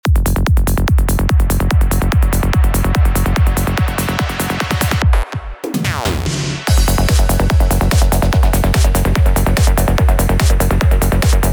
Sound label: build up